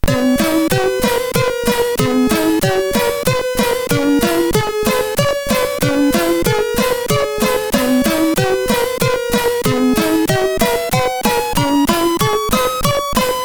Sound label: sid, MOS6581R4; Moving Sadly; hvsc